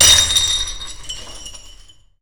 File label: INCEN MOLOTOV